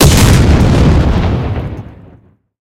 tanks explosion; War Thunder

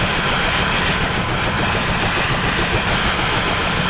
apache engine spin; War Thunder

